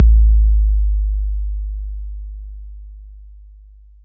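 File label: bass drum 808 Smooth B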